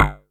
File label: GUMY BASS, Yamaha DTXpress